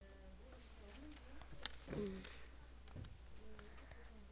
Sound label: car